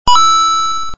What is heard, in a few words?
Coin; Super Hermanos Mauricio